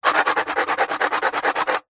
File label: foley drink can empty crushed scrapes scratches fast on concrete; zapsplat